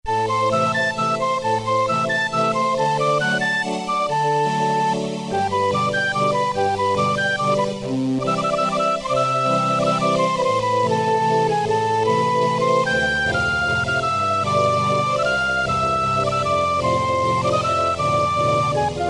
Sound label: classical strings, Technics SX-K450 Voice Combinations